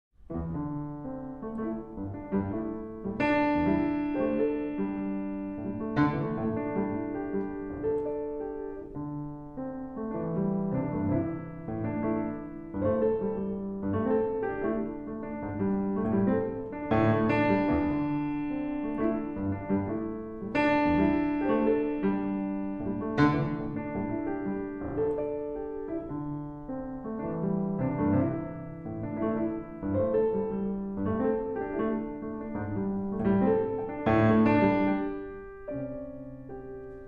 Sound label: piano study